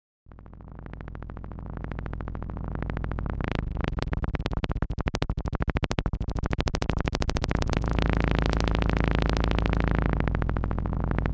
maxi korg repeat function segment

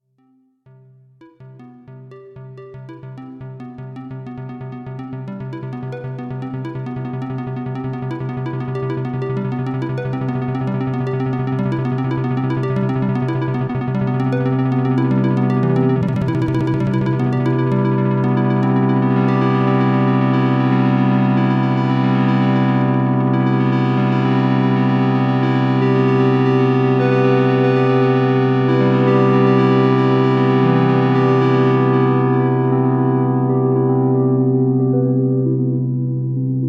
Evolving BS3X Arpeggio

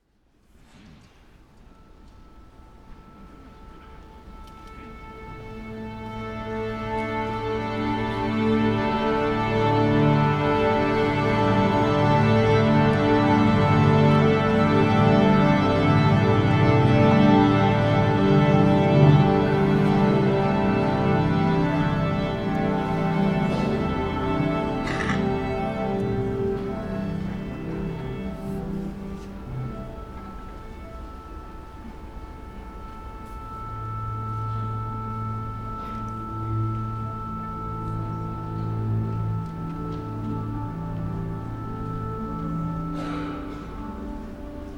orchestra tuning

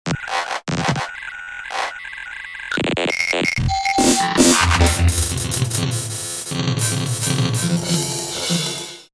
drum glitches

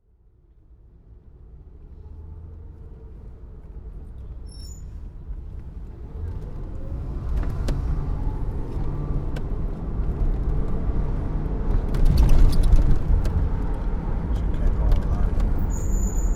taxi

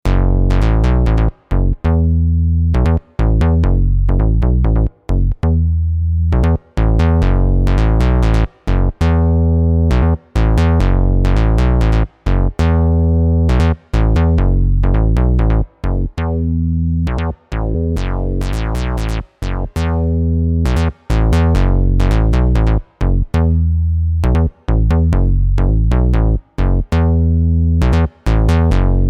New Bass Patch

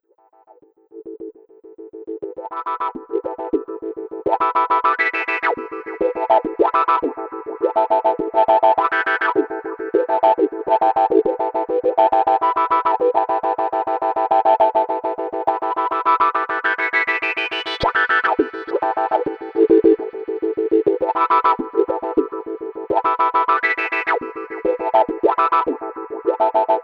appegiated synth clav

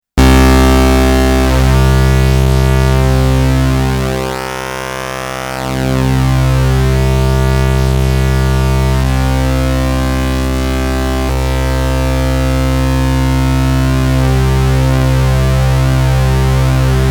Pulse Width Modulation

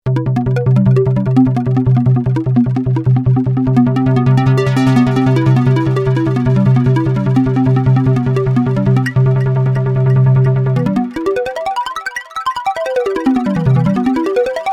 BS3X Arpeggiator

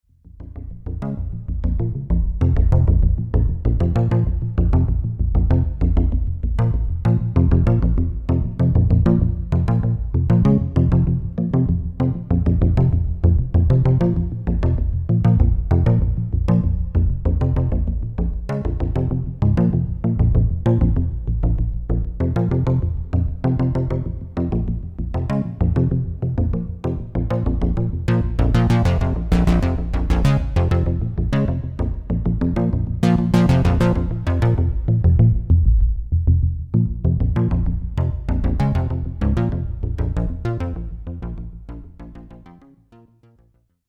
BS3X Arpeggiated Synth Bass

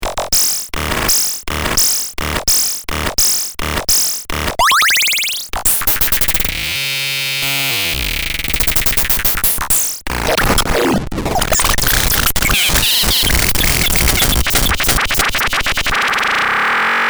8bit graintable etude

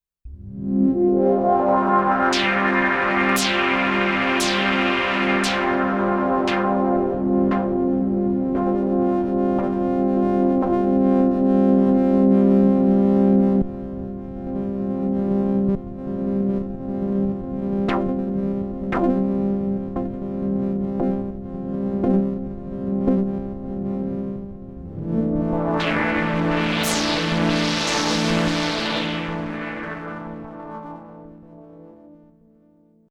Prophet-08 Patch Testing

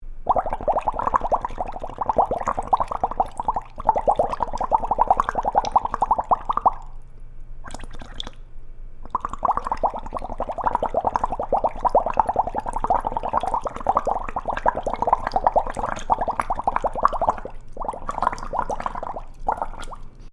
bubbles

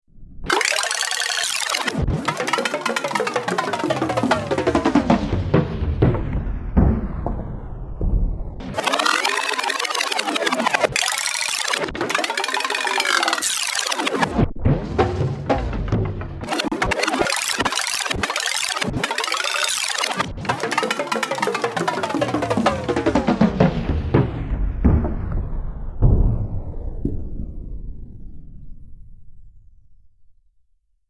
Percussion Loop Spinning

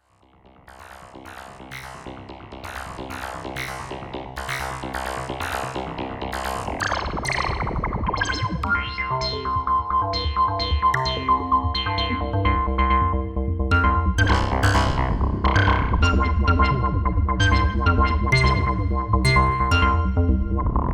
Hyper Tweaked Arpeggio

